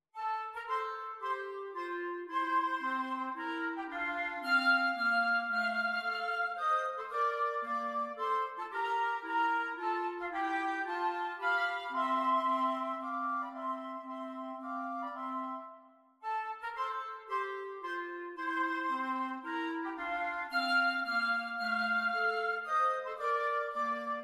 menuetto, woodwind trio

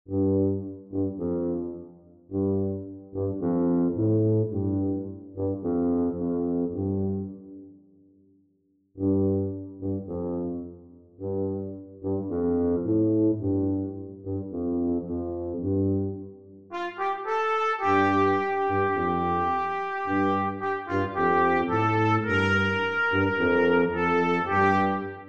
trumpet tuba duet

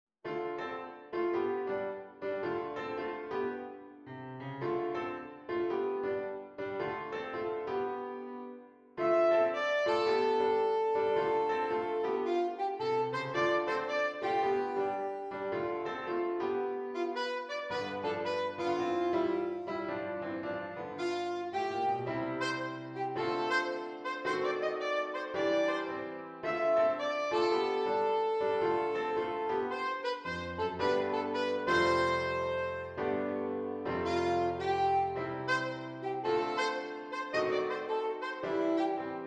SAX; alto tenor or baritone saxophone

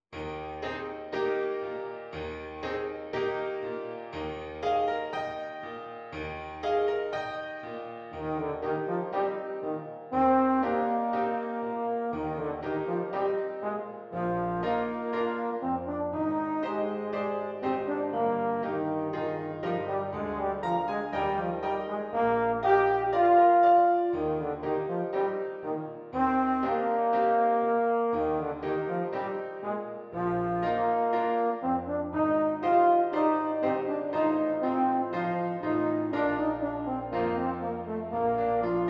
Euphonium Beautiful Trinket; tuba

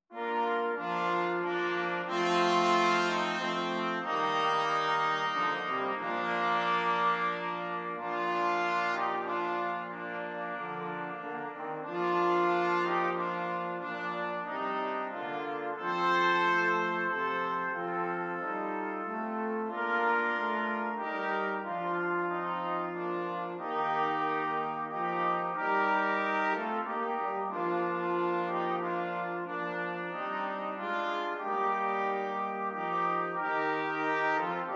Brass Quartet

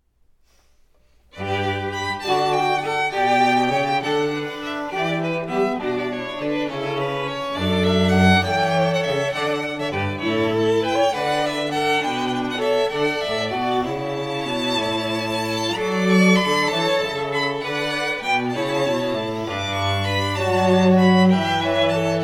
string quartet

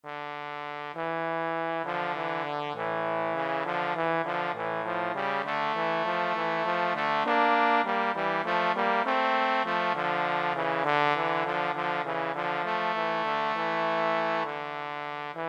cello or TBN; trombone duet

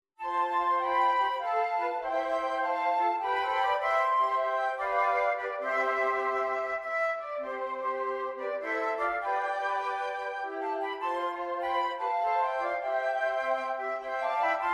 jazz flute; the first noel; first nowell

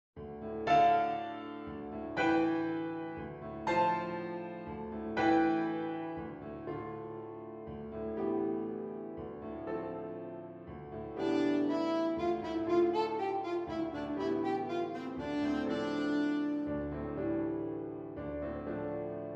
Alto sax; tenor or baritone saxophone

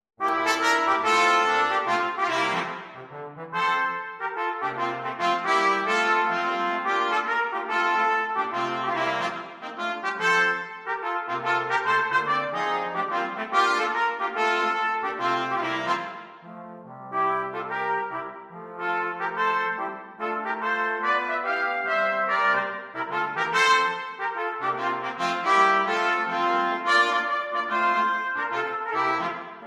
brass

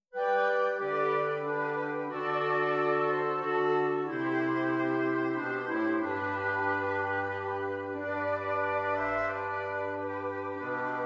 Woodwind Quartet; Carols for Four

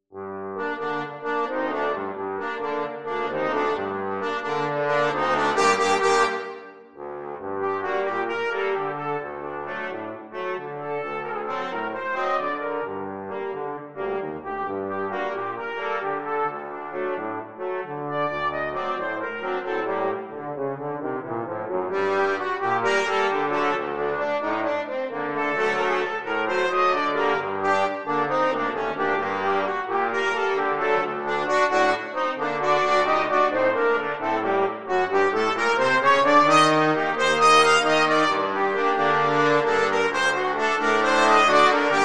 Brass Quartet

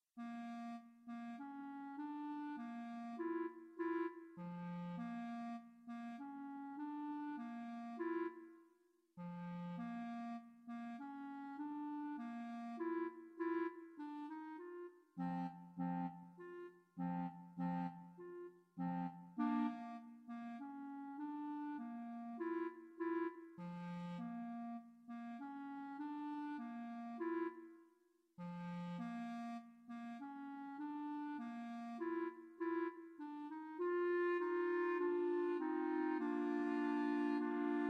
Clarinets, clarinets trio

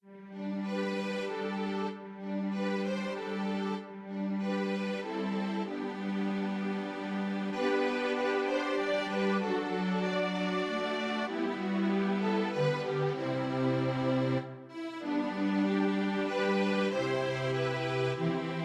string, string quartet

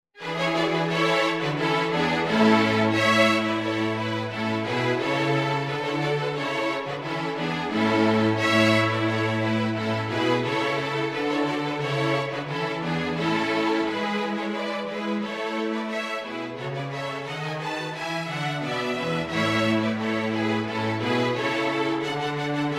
String, string quartet; DECK THE HALLS

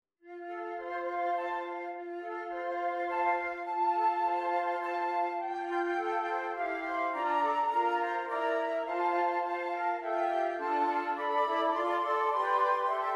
flute, flute quartet; While Shepherds Watched their flocks by night

